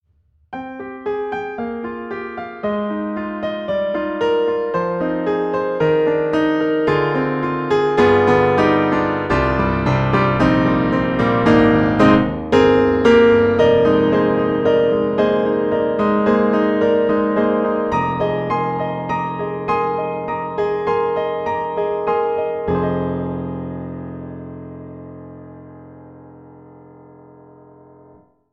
D4 Bright; MODARTT S.A.S.; Pianoteq

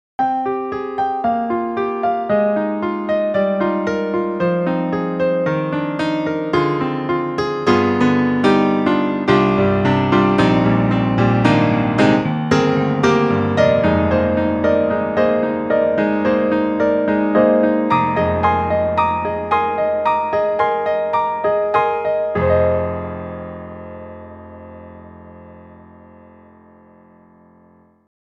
Roland Juno-Gi Monaural Piano

